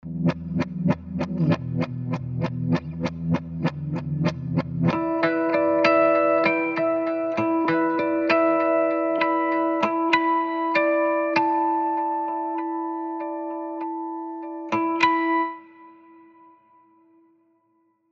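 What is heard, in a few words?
Guitars Pulses forward reverse; Scoring Guitars; Performance Palettes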